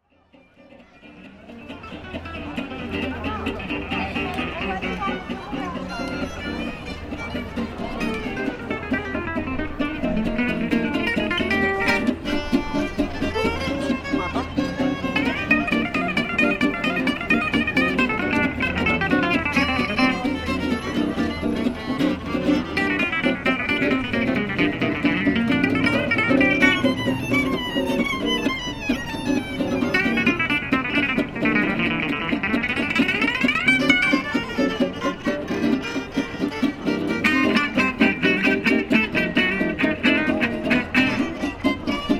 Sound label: jazz festival; Montreal